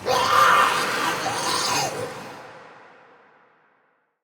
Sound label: Death, Barks, Battle, Soldier; Mendicant